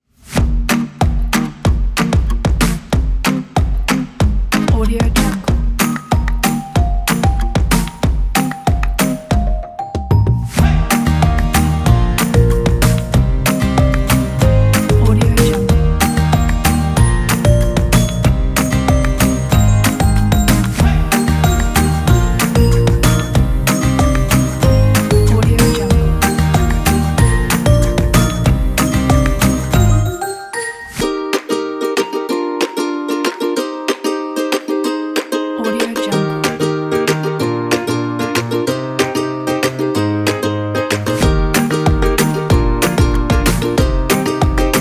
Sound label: happy upbeat